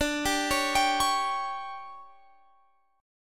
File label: D Major 7th Suspended 4th, Guitar, Open F; DM7sus4; arpeggiated; x,21,19,20,22,20